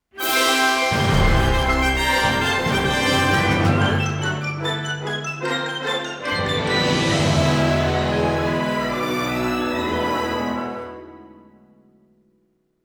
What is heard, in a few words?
Rising Adventure Triads